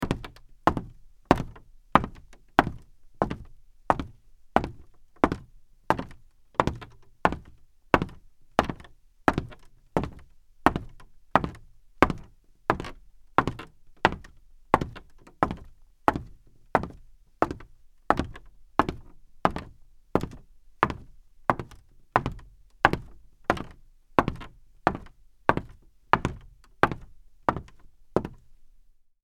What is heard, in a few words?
Footsteps Heels Wood Walk